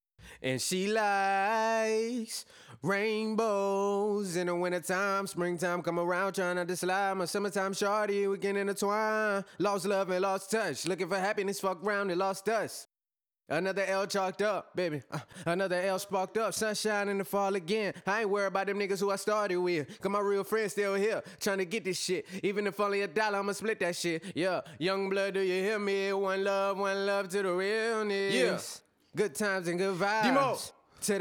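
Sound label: hip hop rap sing, 61, G major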